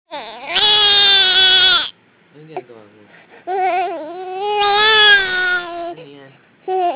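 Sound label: baby hungry, female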